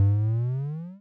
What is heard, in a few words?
spawn